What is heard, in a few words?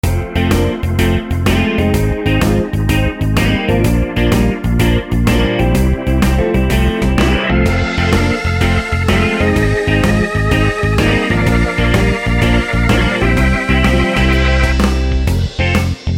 summer 8 beat rock